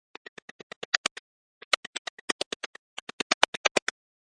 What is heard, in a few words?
Typing Filter, Filtered Typing Sound; Offices; Typing Sound Effected